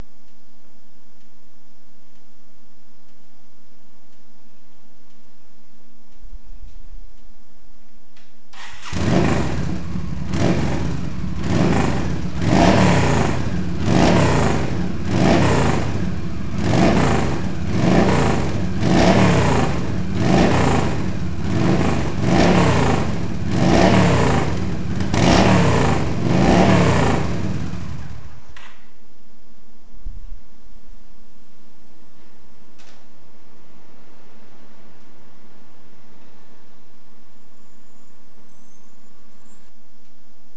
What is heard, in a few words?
XVS1300, exhausts